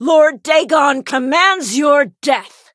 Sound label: attack; dawn acolyte